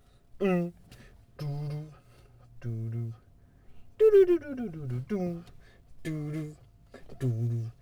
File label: riff